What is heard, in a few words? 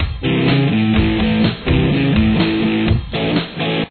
main guitar